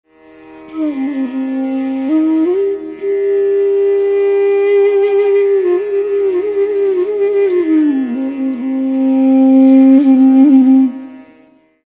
pahadi, world flutes

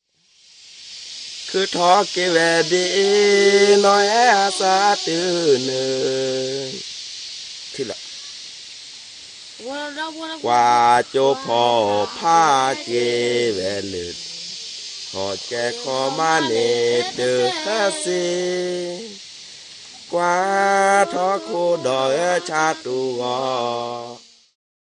karen, tribal thai

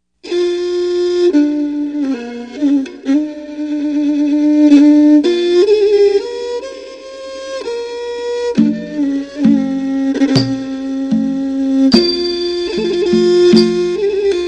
lao kruan, tribal thai

